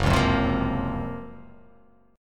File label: Gb Major Sharp 11th chord; GbM#11; strummed; JGuitar